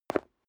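step leather shoes concrete single stair down, footsteps; schritte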